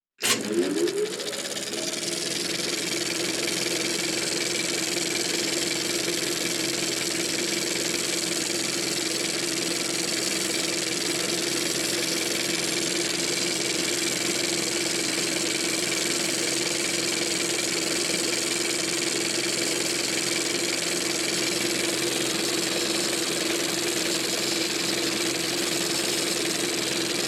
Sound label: Film Projector